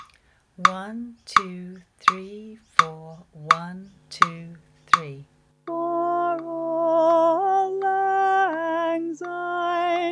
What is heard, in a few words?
bari coda in C; Auld Lang Syne; Folk Choir music